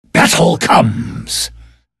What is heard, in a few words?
attack; Phantom Lancer